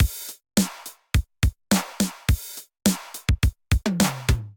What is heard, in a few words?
707 Kit groove 105 bpm, Drum; MIDI Clips; Cannibals; Ableton; Drum Essentials